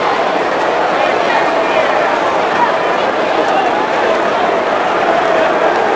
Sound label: Idle, Crowds; XBOX; AND1 Streetball